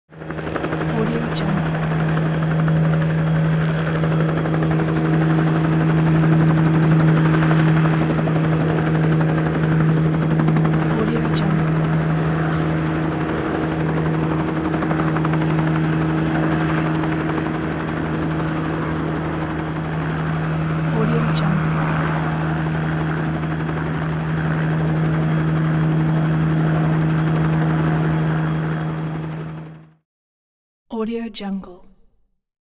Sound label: helicopter hovers overhead; hollywoodedge